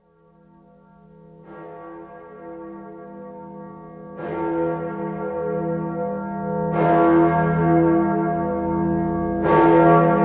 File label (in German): freiheitsglocke; tempelhof schoeneberg; historisches